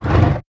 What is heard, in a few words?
Sound Drawer Open